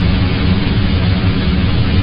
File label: Fire Loop; firewind